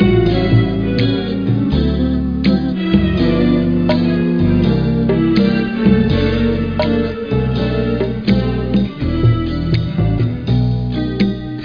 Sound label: jazz